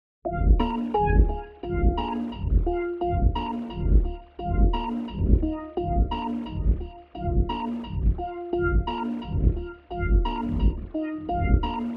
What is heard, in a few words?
Pulse